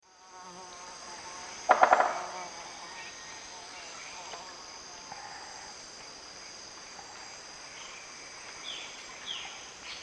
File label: Campephilus melanoleucos, drum; S. America; Eastern Lowlands